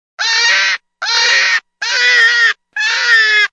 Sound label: Hurt'n Jack, predator calls